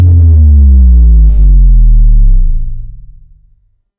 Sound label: drama game over